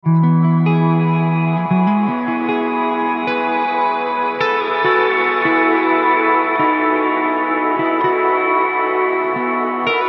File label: air reverb